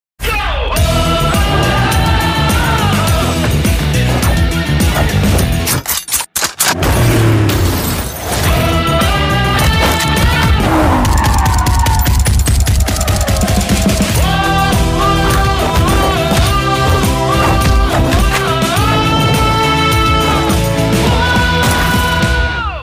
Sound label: Theme Music; Commando 3